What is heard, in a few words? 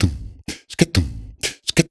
Percussion Loops, Brazilian; Houseworx